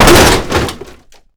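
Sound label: debris metal medium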